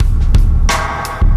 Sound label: Beat, Loops